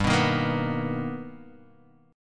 B Augmented Major 7th G, chord

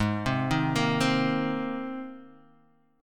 C Minor 7th Sharp 5th Ab

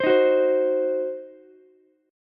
C Diminished C, Ukulele; strummed; JGuitar

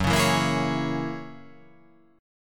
F sharp 9th F sharp, chord

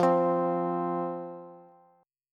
F 5th F, down, Bass; F5 strummed

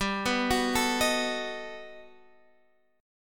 G Augmented Major 9th G down Guitar DADGAD

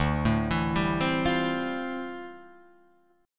D Minor 11th D down Guitar Open D

